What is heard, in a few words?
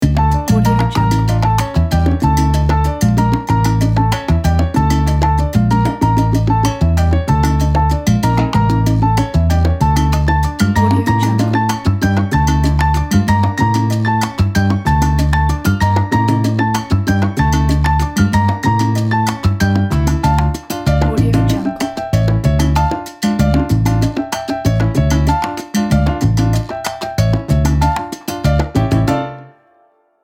latin soul; latincollective